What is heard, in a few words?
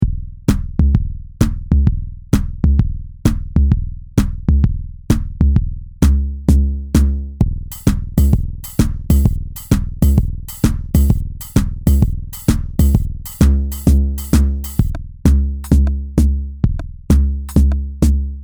Shuffle